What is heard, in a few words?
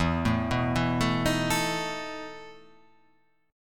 E Major 7th Suspended 4th